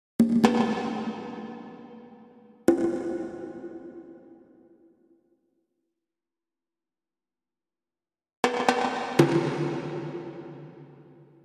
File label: Percussion